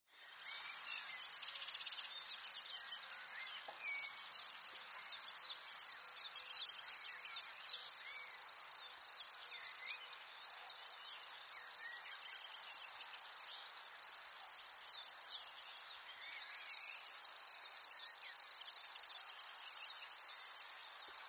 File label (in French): Locustelle tachetee